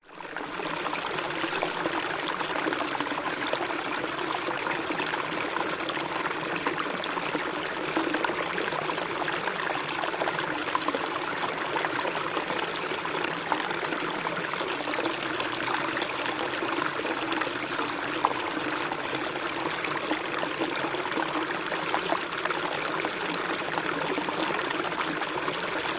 nature waterfall cascading medium flow water over around rocks